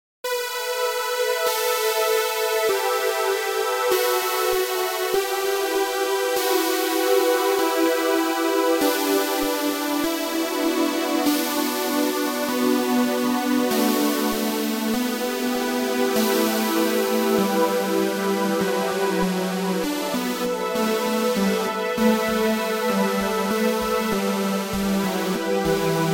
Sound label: Rainy Morning